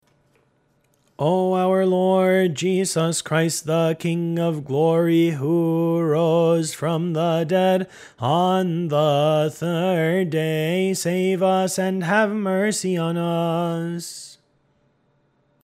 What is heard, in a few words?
concluding canon verse, hymns